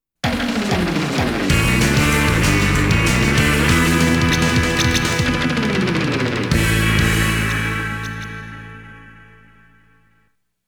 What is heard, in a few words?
WIN, Goal BGM; America Version